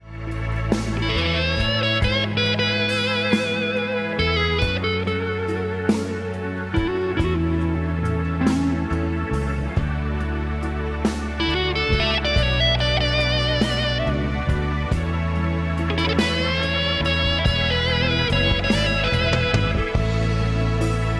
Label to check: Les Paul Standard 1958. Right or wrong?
Right